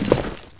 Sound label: jump wood